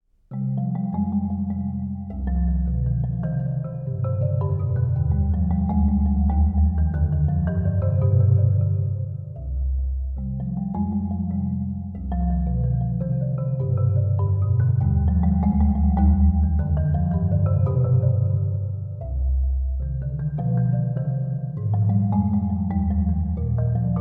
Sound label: Marimba, Cello Suite no. 5 in C minor; Johann Sebastian Bach; BWV 1011